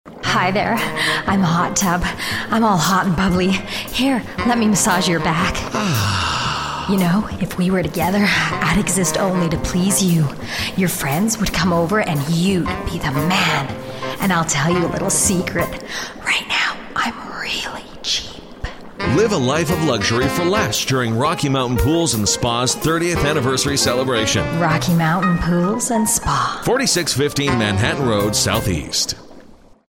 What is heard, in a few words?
Female; Rocky Mountain Pools; Astral Media, Calgary, Alberta, Canada; Large Market - Commercials